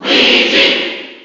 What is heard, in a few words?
Cheer NTSC; Luigi; SSB4